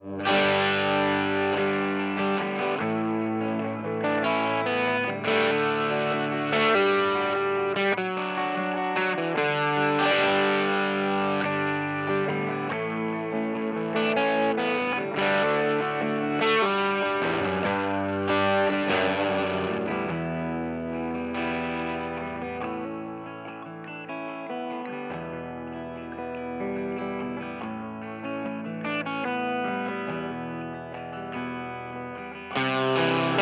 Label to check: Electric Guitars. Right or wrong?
Right